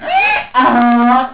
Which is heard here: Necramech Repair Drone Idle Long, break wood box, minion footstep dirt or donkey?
donkey